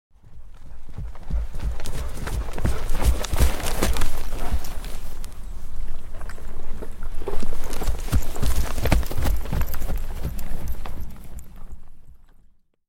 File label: Single Horse Trots Up Stop; Historical; Hollywood Edge Historical Series; War Horses Armies & Weapons